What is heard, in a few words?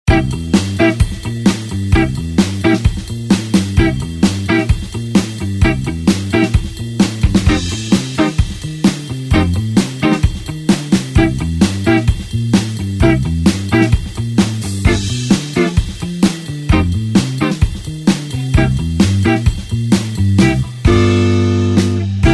blues 8beat basic pattern C